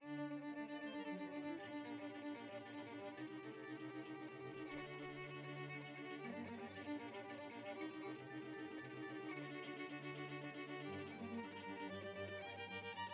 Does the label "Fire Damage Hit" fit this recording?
No